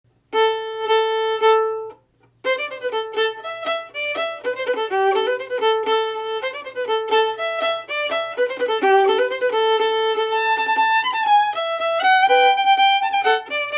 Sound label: the cuckoo fast, fiddle